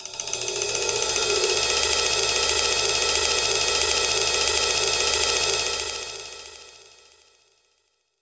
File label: Pop Ride Phrase, Cymbals; Roland BK-5